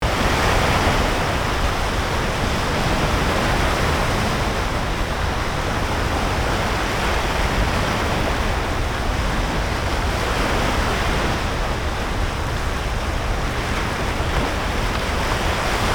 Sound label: Sea; MKH800